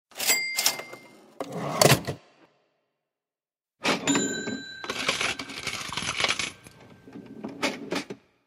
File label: cash register two sounds